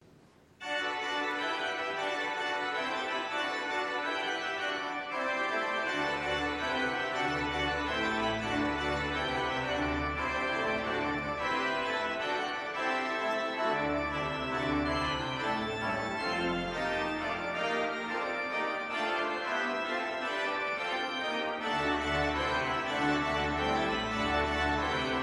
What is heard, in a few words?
Toccata in seven; Rutter